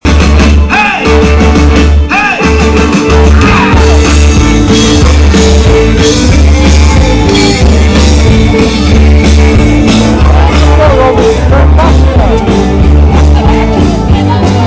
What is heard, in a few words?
rock at the lock